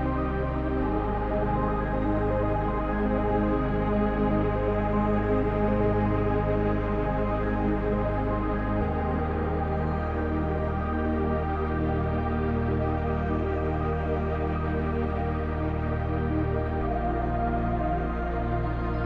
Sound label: Sound healing for mental stability